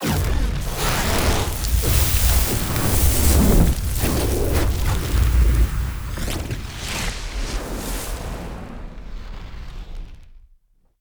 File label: fire burst